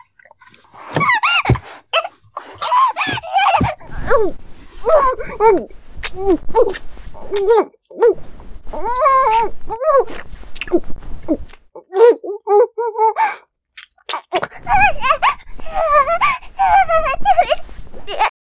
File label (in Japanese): キスで黙らせる; おまけ(性的暴行); 決死の抵抗